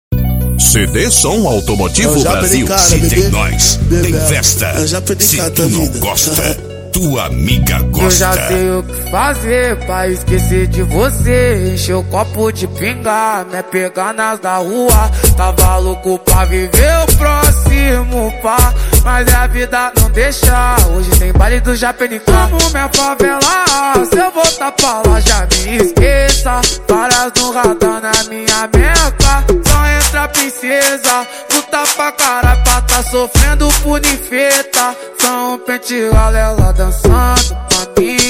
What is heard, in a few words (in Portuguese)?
cd som automotivo brasil especial funk